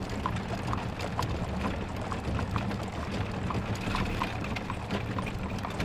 carriage loop

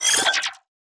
ui rest

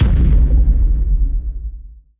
sea ship shoot imp

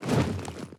flap wing

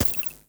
pen arrow

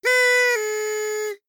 party blower

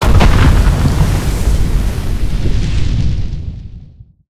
bomb snow

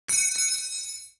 metal collar

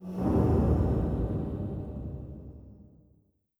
ui bell enter

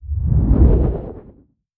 settlement

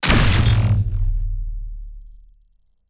emp shock release

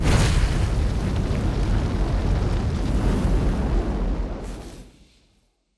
flamethrower

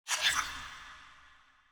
blood

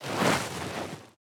dragon struggle

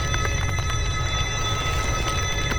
laser loop

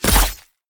sword

